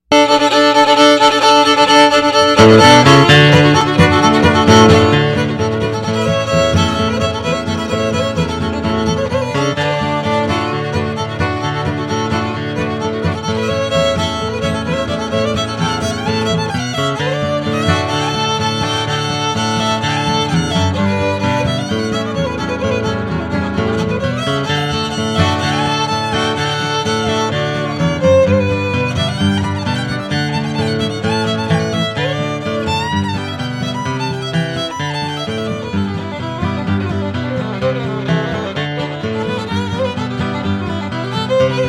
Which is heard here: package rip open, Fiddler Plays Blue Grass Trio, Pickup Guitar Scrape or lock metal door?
Fiddler Plays Blue Grass Trio